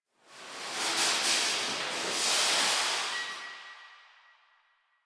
Grocery Cart One Off; Warframe; Ambience; Nineteen Ninety Nine; DE

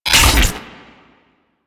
SFX Unplug; Warframe; Orbiter Nursery

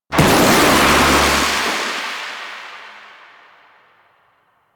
Shipyards Lightning; Ambience; Exterior; Warframe; Grineer Shipyards

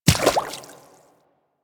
Infested Medium Run Water, footsteps; Warframe

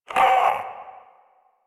Possessed Red Veil Vocal Pain; Enemies; Warframe; Priest Quest

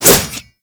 Light Glaive Whoosh D; Warframe

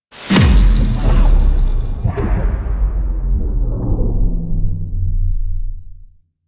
Vault Heart Broken Zap Attempt; Ambience; Warframe; Infested Eris

